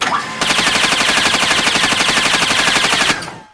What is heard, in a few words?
shoot; sarge